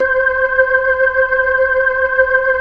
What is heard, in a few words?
B-3